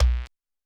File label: Sci Fi